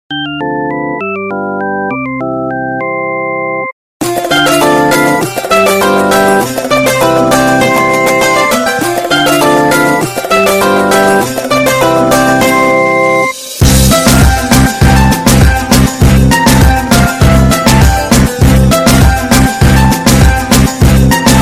nokia turk ringtone

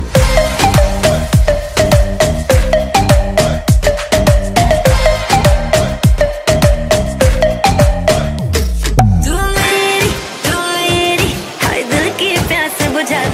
sad music ringtone, bollywood

